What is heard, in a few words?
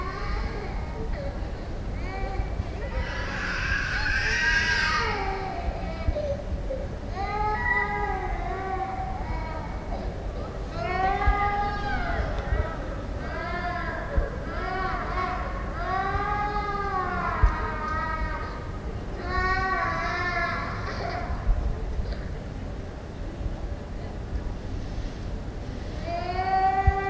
Child Crying In Yard